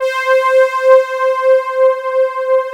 LUSH BED

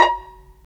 STR PIZ, Roland String Master Series, Vlas Pizz

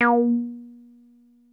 303 A3, TB 303

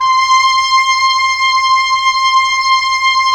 WND OB F, Oboe f; Roland - LA Composer vol. 4

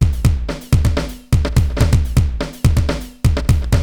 Akai XE8 (125BPM), beats

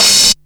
HH OPEN

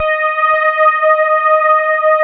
electric pianos; Wizoo Electric Pianos

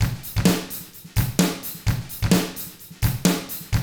HARD ROCK, Drums

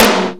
doom tom, Emu Sp12

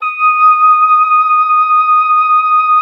D#6, OBOE; Xsample VOL-6

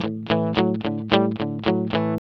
ROCK GS 110, Vintage Blues Guitar; USB Soundscan vol.22; AKAI; ROCK2

